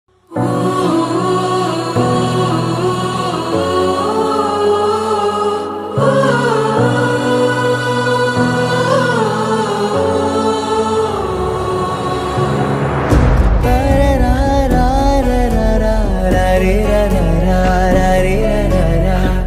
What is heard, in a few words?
alone pain bgm; dada; kavin